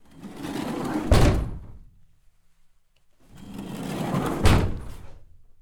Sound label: renault master 2.3 dci foley interior slide door close; Soundholder; Renault Master IV 2.3 DCI 165